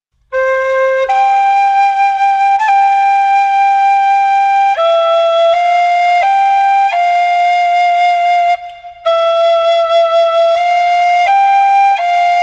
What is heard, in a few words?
Celtic low, wind instruments; wind instruments from around the World; Andy Findon; Ancient Treasures, exploring wind instruments from around the World